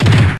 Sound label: Comet Punch